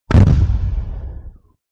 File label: bomb